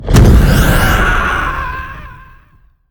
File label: Screaming Sun